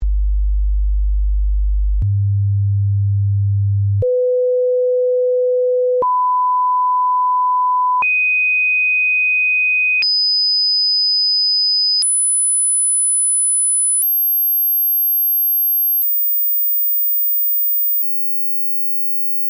Hearing test, Reference tones that can be used to test hearing; High Tone; callorbrate equipment; Reference tones that can be used to test hearing and callorbrate equipment